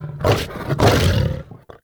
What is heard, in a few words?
Wolf Attack Growl Double; Animals